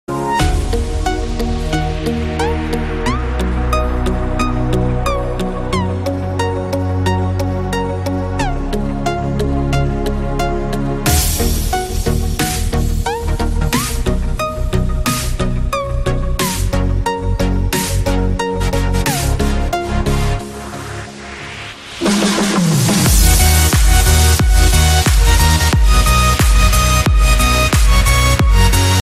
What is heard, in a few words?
instrumental ringtone